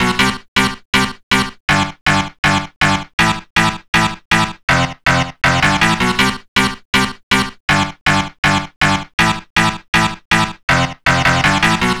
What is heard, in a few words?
Dance Synths, Hardcore Loops, Synth Loops